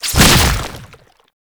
Infested Charge Tentacle Whip; Enemies; Abilities; Warframe; Lasrian Tank

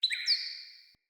Cardinal Female Bird Double Chirp

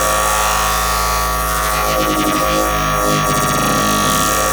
Beam Loop; Warframe; Corpus; Corpus Railjack; Crp Admiral Agent

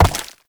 Step Ice; Warframe; Uriel

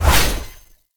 Blade Swipe; Warframe; Melee; Dagath Blade And Whip

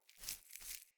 Grunt Foley Active Idle, Enemy; Characters; Corrupted Sproutfolk

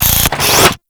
Drone Face Shield Open; Warframe

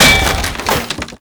Wood Bench Destroy; Warframe; Ambience; NineteenNinetyNine

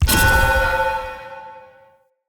Bell Boom Impact; Enemy; Combat; Characters; Corrupted Sproutfolk

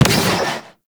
Cannon Fire; Warframe; Sentients; Sentient Eidolon Ghost Caster; Weapon

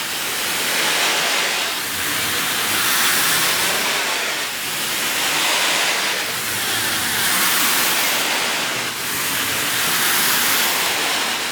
Dragon Luck Poison Loop; Warframe

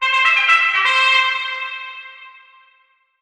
Palanquin Bugle Verb; Fallen From Heaven

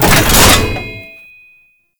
Tank Cannon Charge Start; Enemies; Weapons; Lasrian Tank; Warframe

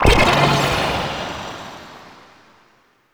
Heal Wave End Cast Burst; Warframe; Anima Animus

